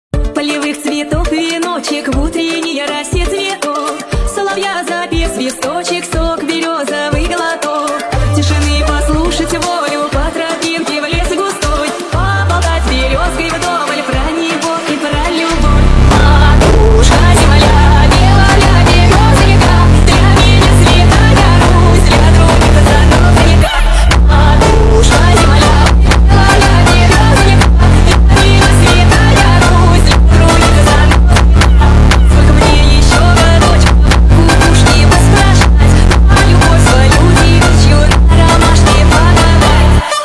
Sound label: FUNK